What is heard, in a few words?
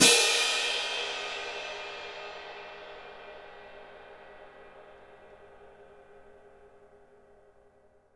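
cymbal, Orchestral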